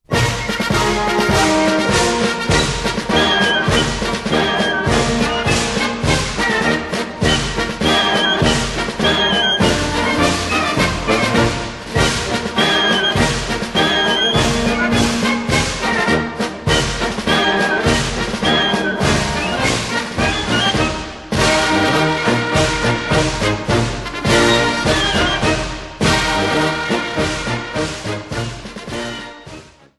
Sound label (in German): Narrhallamarsch, Faschingsmarsch Musik; mainzer narrhalla